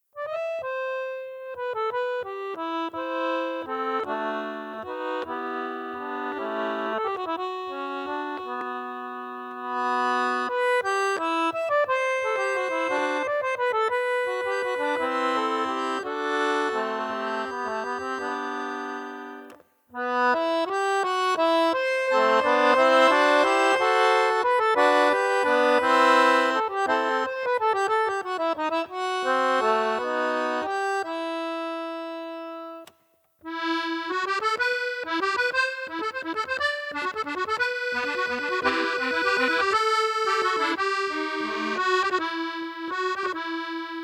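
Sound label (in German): TA3000eco Alle Register, Akkordeon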